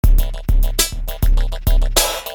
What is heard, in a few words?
electro loop bugs munching; esoteric